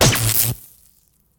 stun dart hit; Original TK Sounds

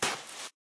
sand step; Golden Crayon Sounds

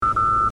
computer beep; Business skieme Beta 1

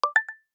Sender Confirmation, iOS Sounds

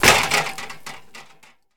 wall metal; Golden Crayon Sounds

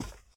carpet step; Golden Crayon Sounds

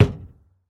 wall metal box; Golden Crayon Sounds

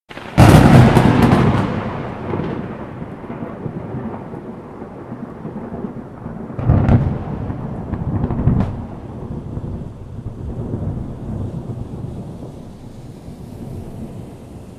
lightning; Golden Crayon Sounds

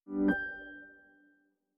startup, ChromeOS

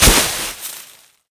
leaves fall; Golden Crayon Sounds

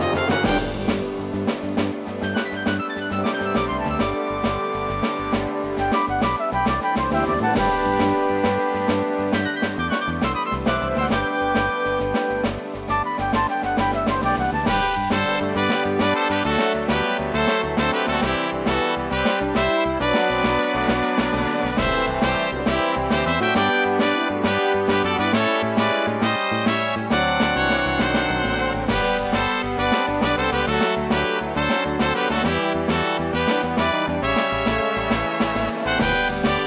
tamer battle victory, music; Manamon Music; Manamon 1

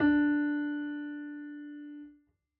piano d; Golden Crayon Sounds

